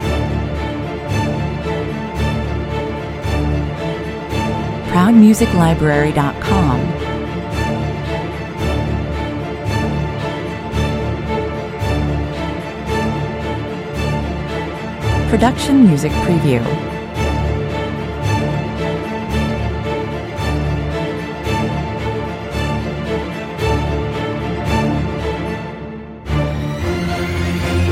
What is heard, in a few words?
strings mix